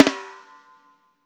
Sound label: LOFI, snares; Spectrasonics; Burning Grooves